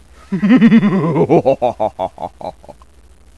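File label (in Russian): жуткий смех одиночный мужской восходящий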